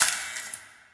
light flicker; Source Engine; Portal 2

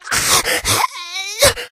death, infected; Left 4 Dead 2; Source Engine

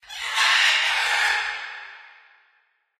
macaw; Source Engine; tf; lair

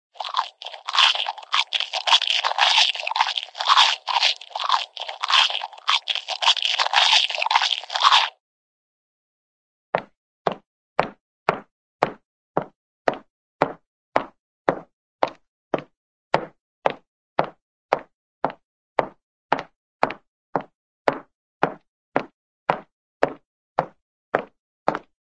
chew, cartoon chewing

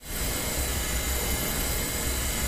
taunt bumper car go loop; video games; source engine; tf

